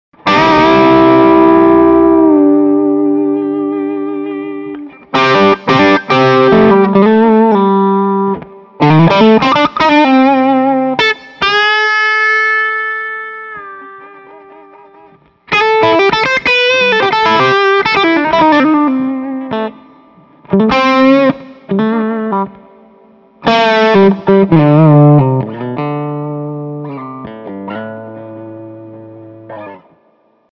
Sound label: 61 tele dirt single note, pickups